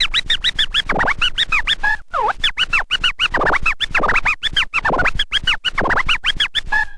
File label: scratch med, Vinyl Attack scratches mix